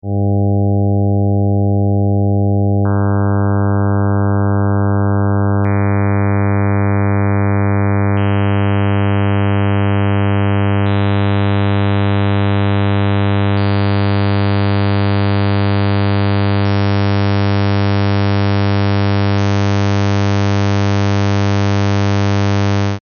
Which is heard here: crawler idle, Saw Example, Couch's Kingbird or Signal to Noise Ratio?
Saw Example